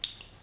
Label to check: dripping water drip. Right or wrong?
Right